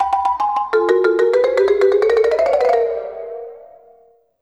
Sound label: Jingle; Elevator Action Deluxe; PS3